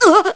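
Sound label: Hurt Burn, gameplay sounds; Link getting hurt (burned); zelda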